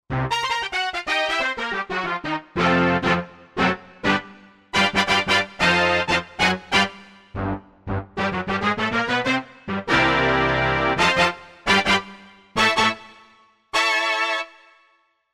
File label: Brass Stabs, Korg Wavestation A-D